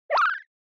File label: Motion Pop